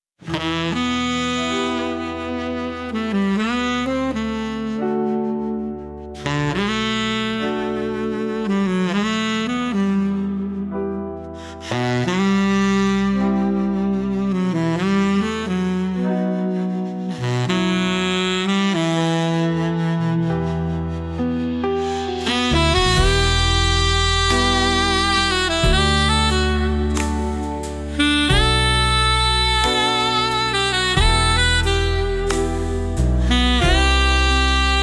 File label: saxophone dreams